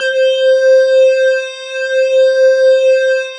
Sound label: Analog, Synthesizers; Soli-Music; World of Synthesizers VOL-1